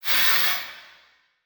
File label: Transition Whoosh Short; Production Element